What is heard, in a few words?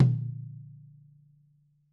tom